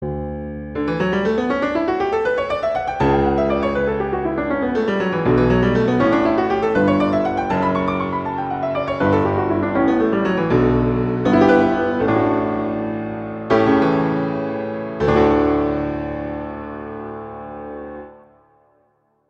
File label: Prelude - D major; Clementi; Preludes